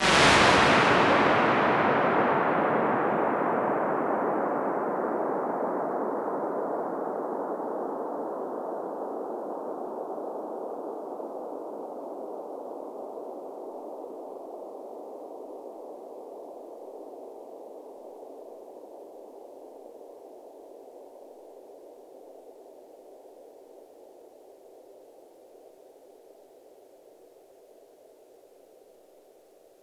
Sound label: Arena Hall; Bigger Spaces; 20s; Impulse Responses; Ableton Live 12 Lite